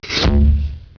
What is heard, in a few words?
poof